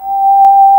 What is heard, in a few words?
flute G4; Ableton Foundation FreePacks; Foundation Glitch Toys & LoFi